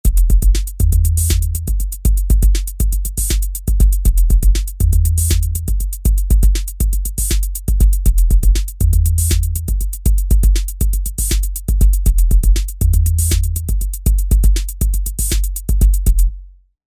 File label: Drum Bass